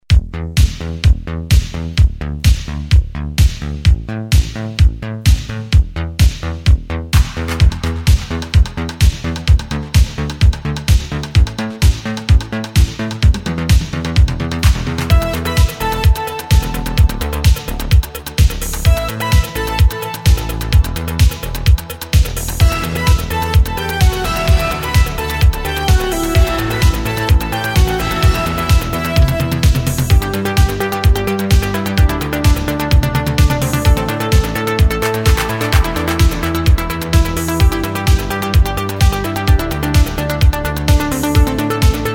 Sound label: instrumental